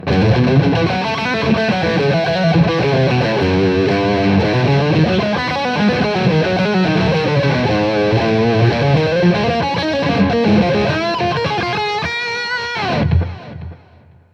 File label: Guild S281 Flyer Warmup; 1986; guitar